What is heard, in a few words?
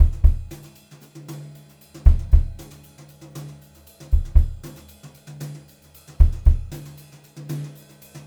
HOTROD VARI, Brushed Drum Loops; USB Soundscan vol.39; AKAI